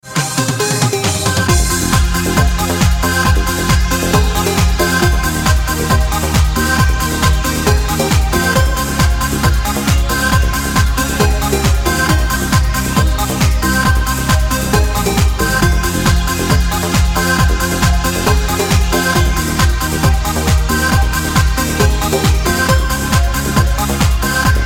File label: classic trance, ringtones